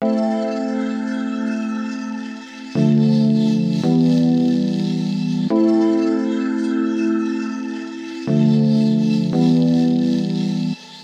Melody, Hip-Hop

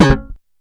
FUNK SNIP; E-MU Formula 4000 Series Vol. 1; Hip Hop Nation; Big Bottoms

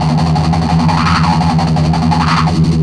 Riff, Industrial; Nasty

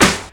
SNARE; E-MU Formula 4000 Series Vol. 1; Hip Hop Nation; Bonus Perk

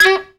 FUNKY SAX; Zero-G; Funky Elements

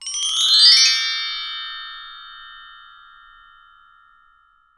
BRS MRK, TREE BELLS; Voice Crystal - Steve Reid Definitive Percussion

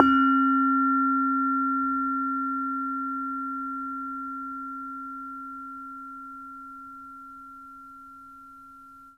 SARON C#2; Spectrasonics; Heart of Asia

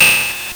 LSDj, Nintendo Gameboy; 300 Drum Machines

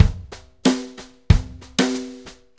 Brushes Loop, Full Kit Loops; Sonic Foundry (Sony Creative Software); Loops & Samples Drum Tools

